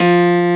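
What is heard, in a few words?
keys, pop piano; Pop Pno+MKS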